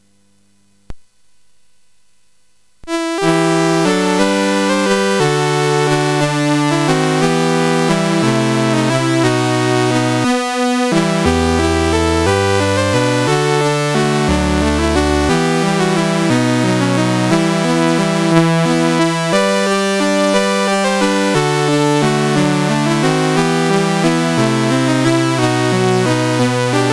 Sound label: Greensleeves, CSG8580R5